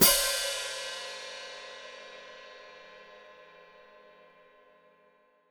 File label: Hat Closed Open, Hi-Hat